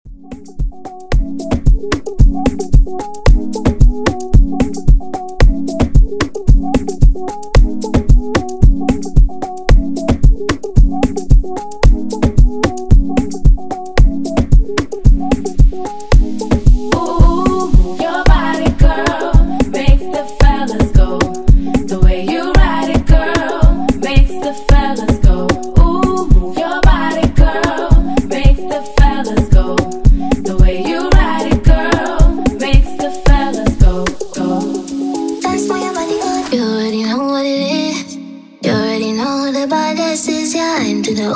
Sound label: hype intro clean intro